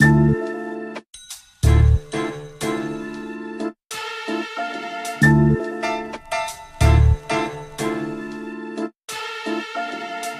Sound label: keys funk; loops